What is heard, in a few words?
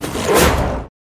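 Rat attack up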